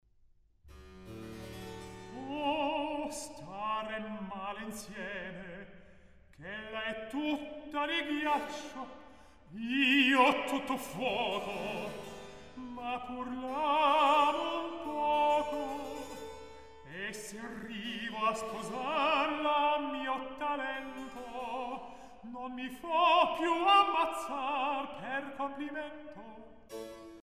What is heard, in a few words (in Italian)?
Recitativo; Oh starem male insieme; Mozart; La Finta Semplice